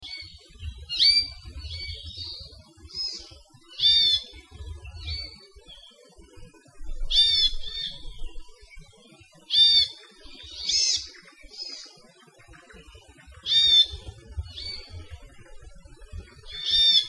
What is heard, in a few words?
amakihi